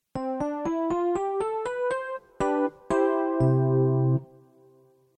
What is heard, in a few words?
MKS-20